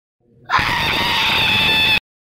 scary scream

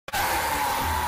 scary girl

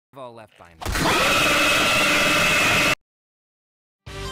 scream, Scary Sound Effects for Jumpscares; Jumpscare SFX

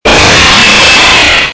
jump scare

